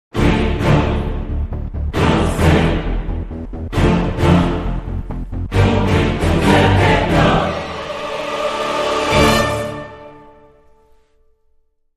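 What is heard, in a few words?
Dramatic Sting, No Percussion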